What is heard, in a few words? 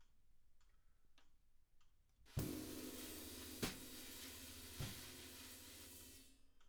Drums